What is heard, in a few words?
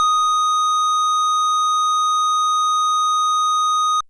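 FM Sq Dist DX100 D#5; square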